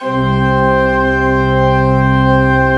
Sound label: C1, Cathedral Organ; ILIO; Propeller Islands Cathedral Organ